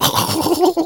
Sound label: LAUGH; MR BEAN